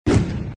Sfx Enemy Knight Attack

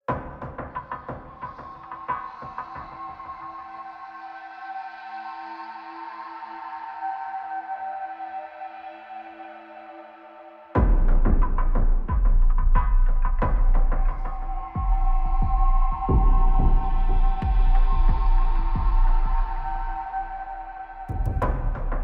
Exploration Night Rhythmic

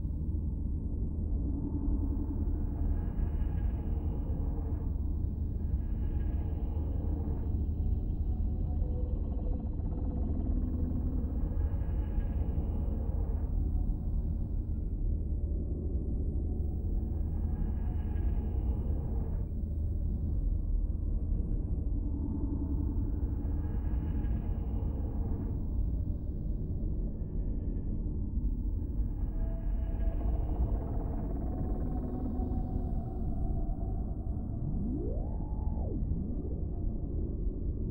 TARDIS Atmos Loop; Doctor Who The Edge of Time; BBC

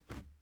plastic jump; teardown; ps5 windows xbox series xs

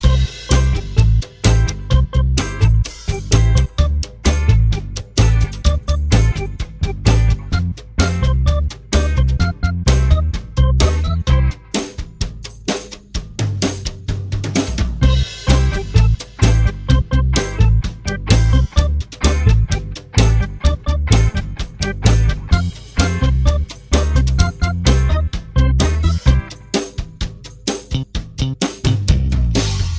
Funny Organ Groove; tiny hands adventure ps4 switch windows xbox one gamerip 2018

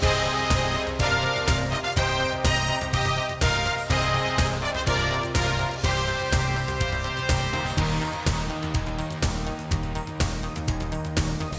In Flight Music; Strike Fighters Attack; Android

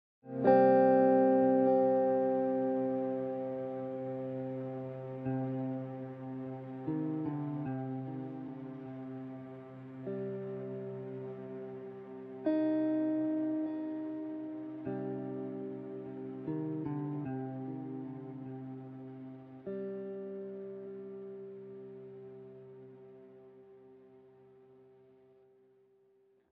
Exploration Stop 100bpm 6bar; project zomboid; Waiting

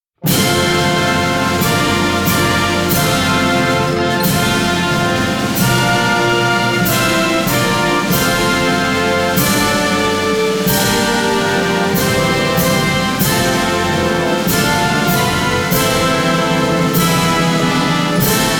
National Anthem Russia; RUS ALL

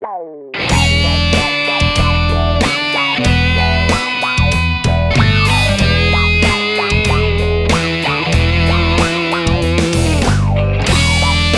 Jingle; Gran Turismo 5

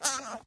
Llama Girl Idle

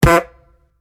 Hit Melee Blow; Skill Hit Melee Blow

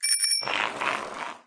Bicycle Leaving Faster

